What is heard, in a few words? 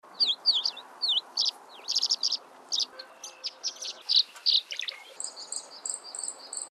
SWALLOW TREE, birds; species/birds; wbba